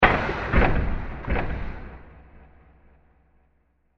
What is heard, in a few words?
Crash With Delays; Bursts; Impacts; CraftedSignals